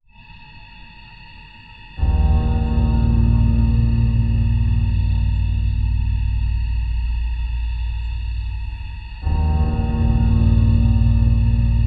cutscene sfx

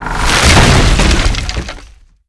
airport storefront crash; Modern Warfare

